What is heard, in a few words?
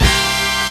HORNHIT, HORN STAB; E-Lab; X Static Goldmine 2